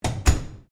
Door Pivot Industrial Freezer Close Stereo, Industrial fridge door; Miscellaneous Doors